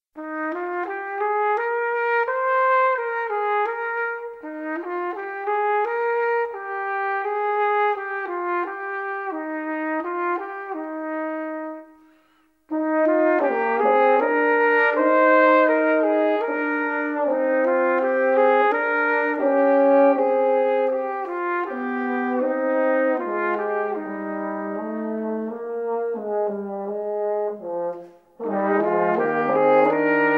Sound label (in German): Blechbläserquintett